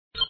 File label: beep; stopr